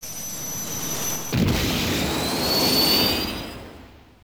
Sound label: [SFX] Take Off; Gun Frontier; arcade